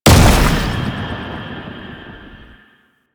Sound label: Unyielding Gallop Hit; Warframe; Dagath